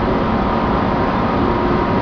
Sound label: tunnel loop; SpecOps2 MPlayer Demo